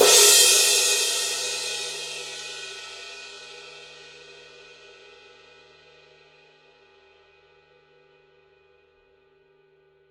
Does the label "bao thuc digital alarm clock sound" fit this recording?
No